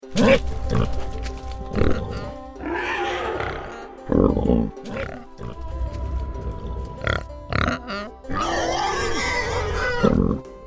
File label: Pig Farm Animal Groan